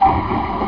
slide

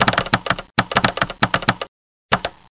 EXIT DUNGEON

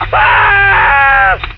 waah; Uprising

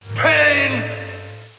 PAIN

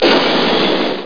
rocket

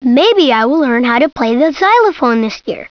XYLOP

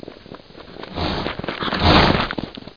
Bull Charge; Front Poster

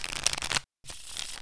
SHUFFLE; Free Hearts; Gambling

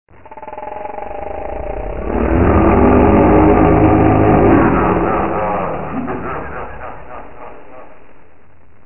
skull start windows; Desktop Studio 2000

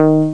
bass

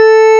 beep red; Nelson Piquet's Grand Prix Evolution

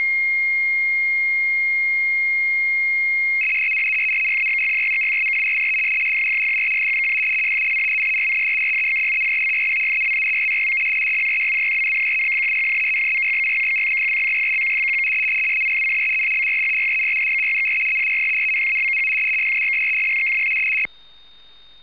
rtty150

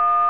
TONE; webphone

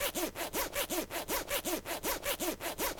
zipper; Sonic Foundry; junkyard rhythms

